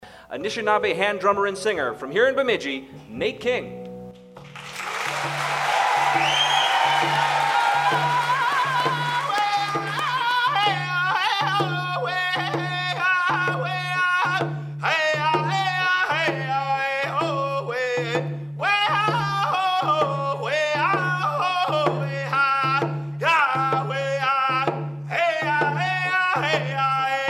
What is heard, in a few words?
drumsong; Bemidji